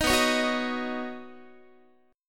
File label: B Major Add 9th B Guitar; strummed; 18,19,16,16,19,x